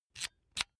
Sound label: electric drill template slide in slow; soundpool